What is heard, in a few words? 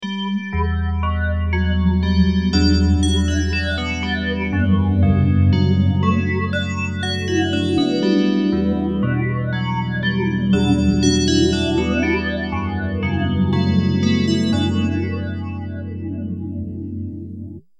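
DX7 bells, phaser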